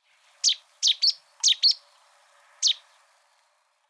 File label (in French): Bouscarle de Cetti, chants oiseaux